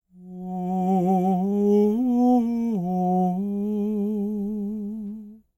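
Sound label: falsetto, Vocal Planet Jazz FX; Spectrasonics; SA FALSETO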